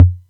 Atlantex Music Percussion Computer Kick

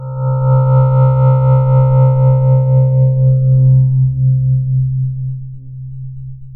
Powerplant, FM; Polyend

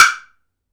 woodblock; AKAI S6000 CD-ROM - Ultimate

